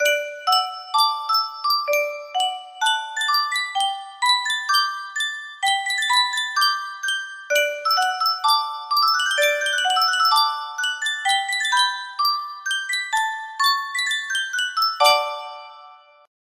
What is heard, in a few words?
yunsheng custom tune music box, music box melody